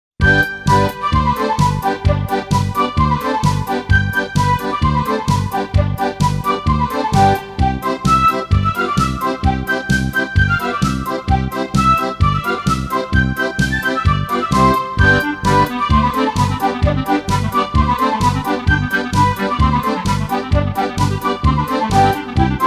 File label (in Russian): gopak, ukr nar